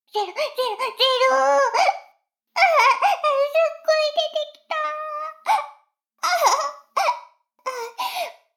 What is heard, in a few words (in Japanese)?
シコシコ音声; 逆時間停止されて